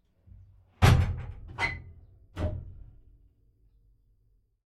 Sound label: SKOT Tail Door Close, SKOT OT-64 1968; MKH8060; Pole Position